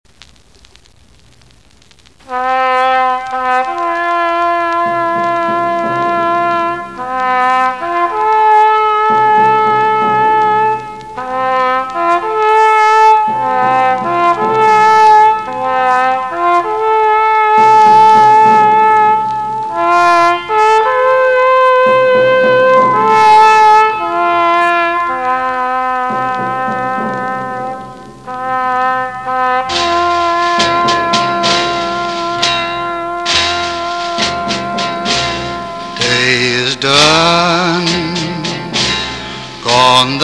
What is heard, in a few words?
taps; Tacco e punta; danza, Italia